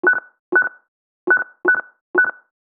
Cash register checkout pressing buttons

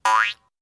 Game jump boing

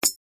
Dropping a coin into a piggy bank

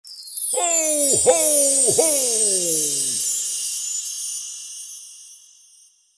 Santa claus magical laughs; festivities

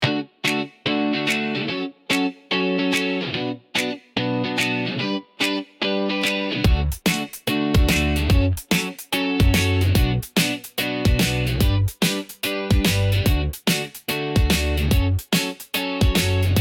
Upbeat optimistic keep it up

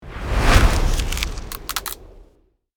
Cinematic ice freezing transition; Trailer Sound Effects

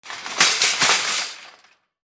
Shopping cart collision impact

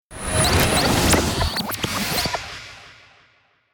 Time travel reverse whoosh

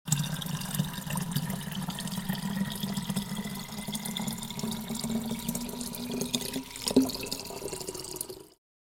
Filling a plastic water bottle

